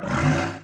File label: MOVE; C64 Dreams; Multi-Sound Default